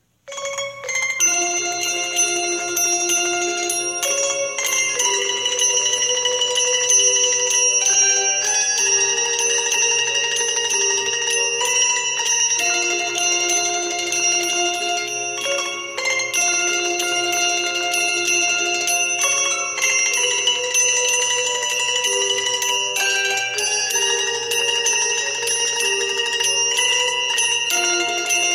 Music

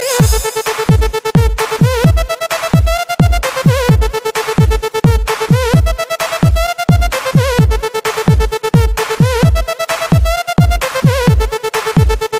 electro song, ringtones electronic

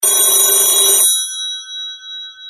classic telephone, ringtones

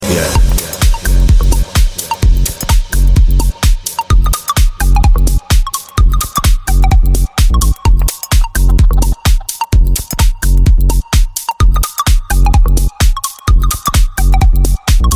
Minimal Electro

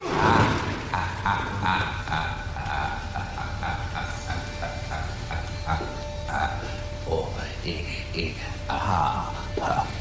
Entrance Laugh; Joker

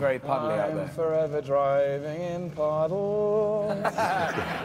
driving in puddles

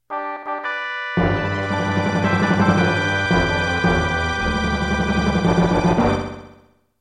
Fanfare

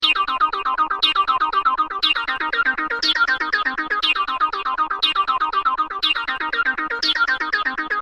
Electric Tone

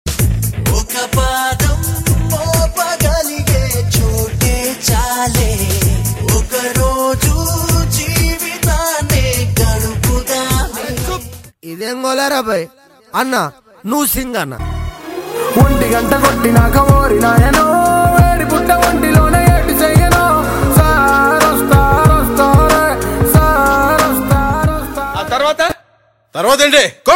surpise tone telugu; ringtones

